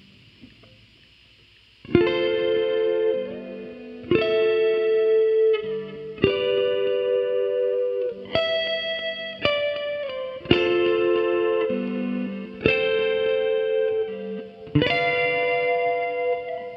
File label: riff